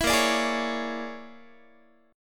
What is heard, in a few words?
Bb 7th Sharp 9th Flat 5th Bb, Guitar; Bb7#9b5; strummed; JGuitar